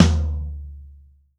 TOM 3L L, DRUMKIT

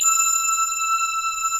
LINEAR SYNTH

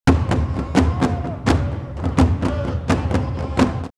Zanzibar 128, drumloops World Ethnic; X-Static Goldmine

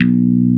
ELECT. BASSES; OMI - Universe of Sounds

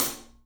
Studio Hats, 3DPercussives; E-MU Producer Series Vol. 5 – 3-D Audio Collection

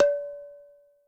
Tabla OV, Yamaha DTXpress; drum machine; Percussion

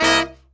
C BRASS; E-MU Formula 4000 Series Vol. 1; Hip Hop Nation; Booty Brass

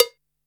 DR-660 Perc, Drums, Boss DR-660

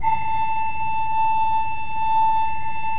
A4, Cathedral Organ; ILIO; Propeller Islands

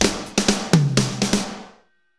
Medium Tekno, Drumscapes Roland; Northstar - Drumscapes Roland

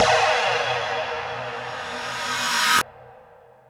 FX Sounds, Drum Machines

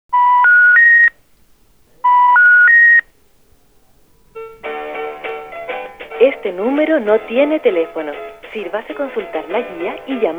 Salsa Picante; Masterbits; World Zone VOL-3

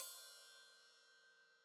Hat Open, Hi-Hat; Deeply Sampled Drums; Cymbals Stereo

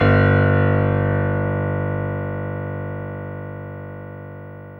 PIA MICRS, MICRO-PIANO, Roland

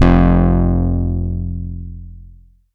Bass, 2600 F1, Synths, ARP 2600

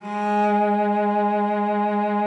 CELLO VIB G#3; Xsample VOL-7

